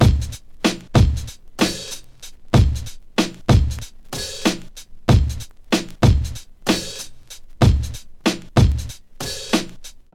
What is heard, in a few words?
94.5 g sharp vinyl drumloop